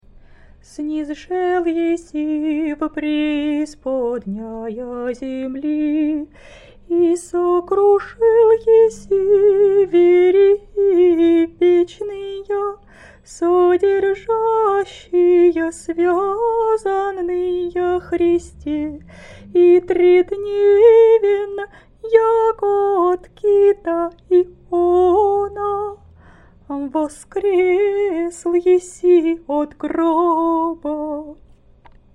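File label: easter canon byzantine hirmos faster, singing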